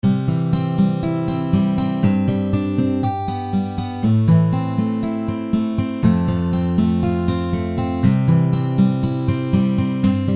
electronica background guitar music tone ringtone

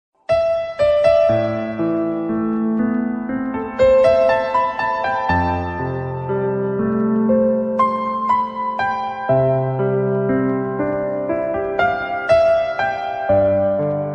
instrumental love background music tone

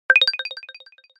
sms alert tone htc

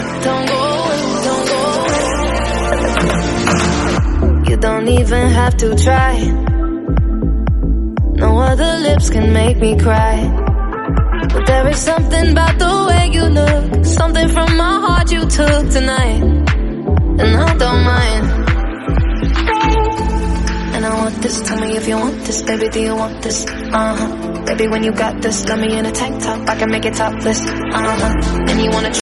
hip hop song ringtone

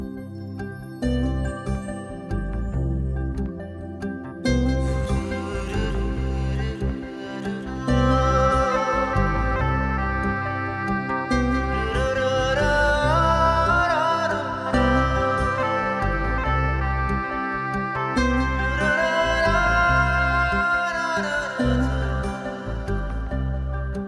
hindi ringtone, bollywood

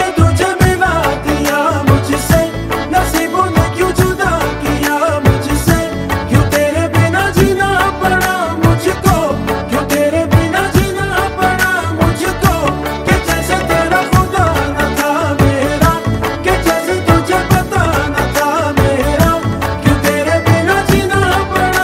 pakistani drama ringtone

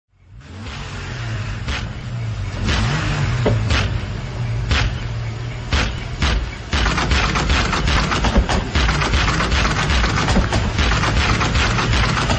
techno

